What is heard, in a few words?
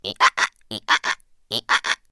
little cartoon creature hiccup